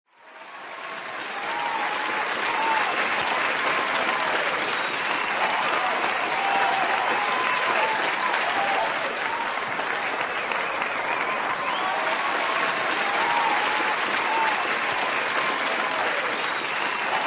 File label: applause crowd cheering; sound effect